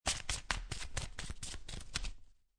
Playing Cards Shuffling Cards In Hand; Casinos

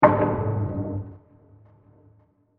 Wood Hit With Echos; Miscellaneous Impact Sound Effects; Miscellaneous Impact (Sound Effects:Impacts)

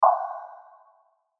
Hit Filter Warble; Sound Design; Blastwave

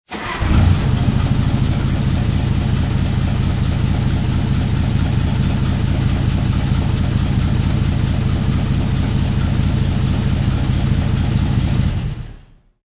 Diesel Engine, Start, run, turn off.; Motors; Machines

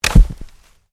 WOOD PLANK BREAK

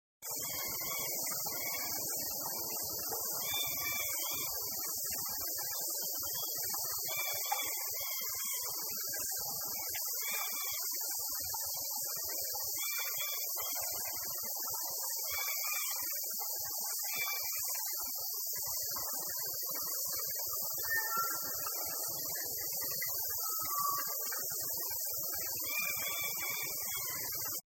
Rainforest Cicadas; Nature Scenes

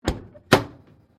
Icebox 1940s Door Open; Various old or antique devices; Appliance Sound Effects; Appliances (Sound Effects:Household)

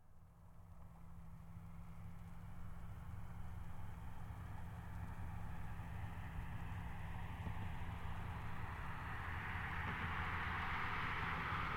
Car Highlander By Fast; 2005 Toyota Highlander V6; Cars; Vehicles; Big Room Sound

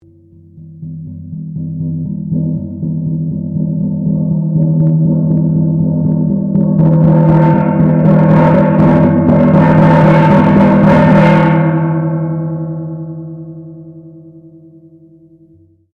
Gong Nipple Indonesian 20 Inch Sequence; Indonesian gong modern copy; Musical; Miscellaneous Musical

